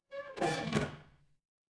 door creak

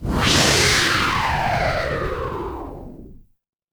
magic scifi rocket

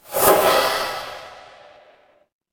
magic scifi spell

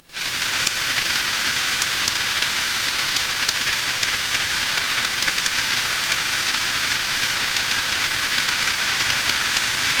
ambience rain

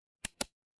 ui toggle

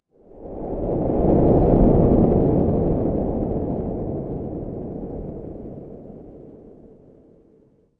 horror wind whisper

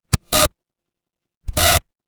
mechanical gear

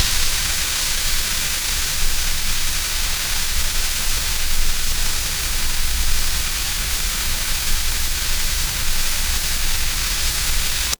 ambience rain

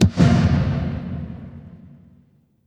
transition impact hit

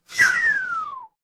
cartoon slide whistle

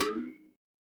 cartoon boing

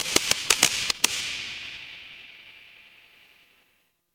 fire electric spark

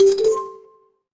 ui chime

